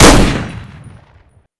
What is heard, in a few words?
Minigun Fire